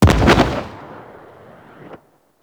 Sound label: Destroyer Meltdown Small Explosion; Warframe; RailJack; Grineer Crew Ship